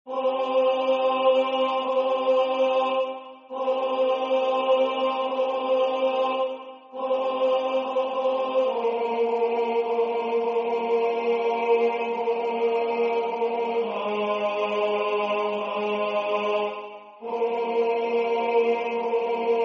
tenor 1; Stemning

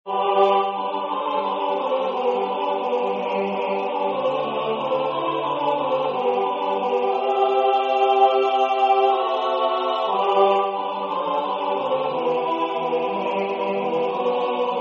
tenor, koor; Dans ropte Felen

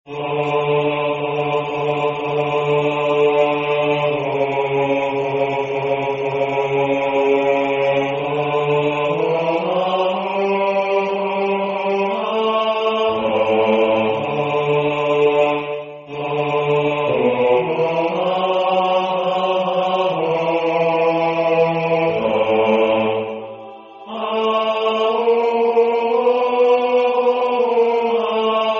Bas, koor

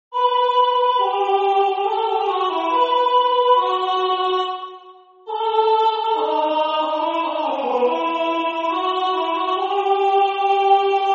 Tenor, koor; H.Purcell

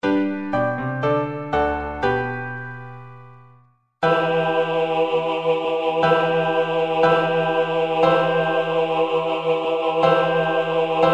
Bas, koor; A.Vivaldi; 4.Gratias agimus tibi

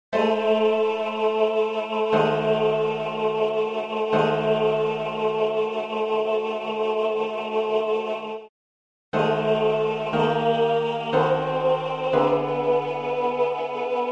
Tenor, koor; A.Vivaldi

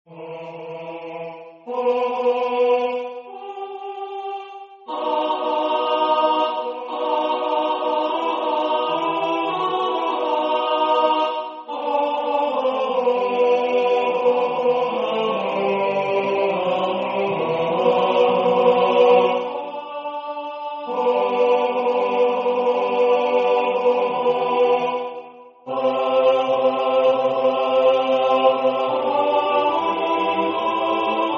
Tenor; H.Purcell

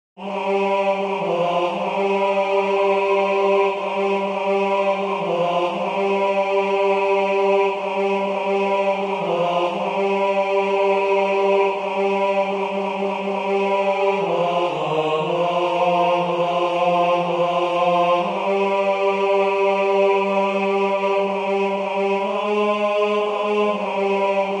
Bas, koor; Wie der Hirsch schreiet

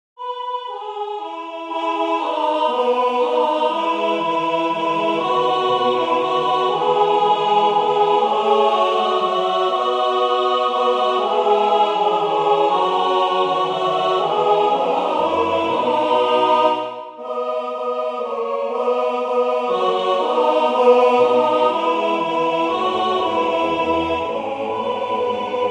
Chorus Alt; H.Purcell

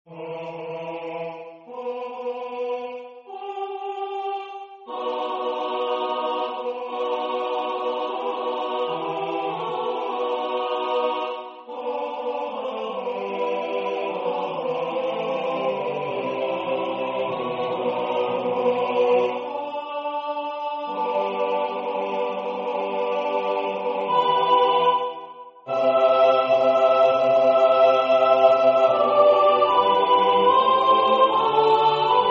Sopr.; H.Purcell

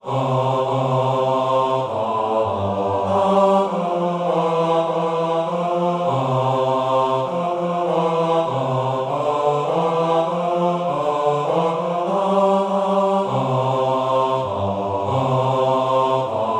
Bas; The first Nowel

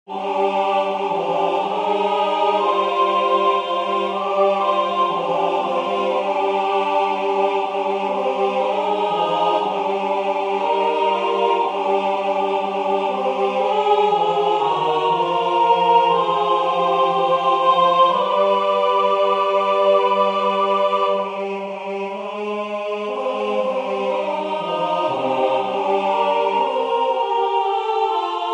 Tutti, koor; Wie der Hirsch schreiet

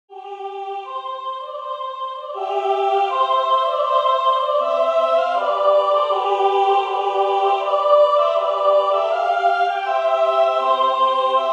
vrouwenkoor Sopr. I; H.Purcell

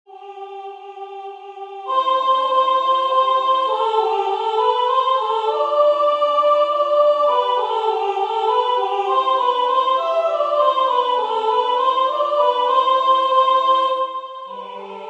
Tenor; J.S.Bach; Ich will den Namen Gottes loben

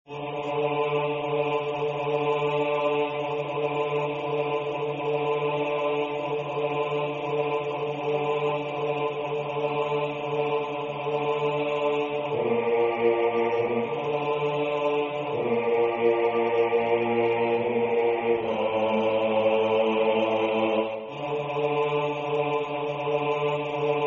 bas; Ved Havet

